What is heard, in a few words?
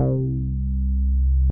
Velocity Edge Bass; Instruments; Ableton; Factory Packs; Synth Essentials; Operator